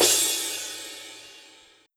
drum hit finish; osu

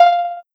soft hit whistle

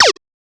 drum hitwhistle; osu

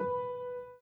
Piano Chord

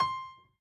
piano lo

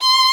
violin; osu

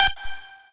lead

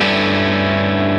G6(9_#11)

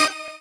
normal hit normal

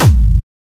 drum hit whistle

